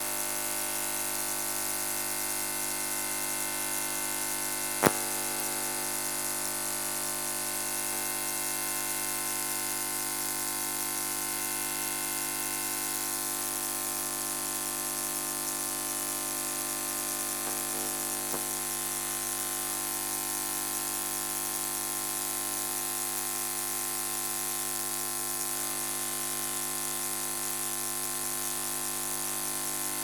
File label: Circuits Electric Hum Buzz Noisy; ShapingWaves